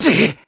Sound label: Hit; Ghirahim; zelda sounds